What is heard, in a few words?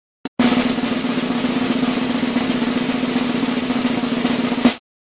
drum roll